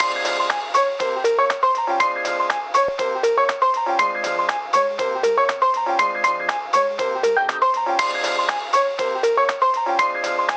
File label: ragtime ringtone